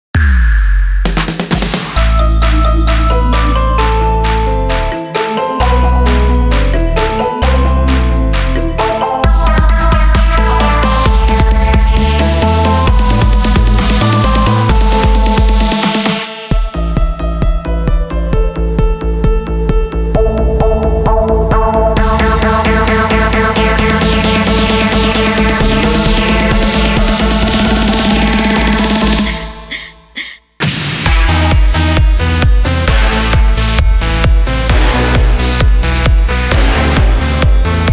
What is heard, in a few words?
dance concert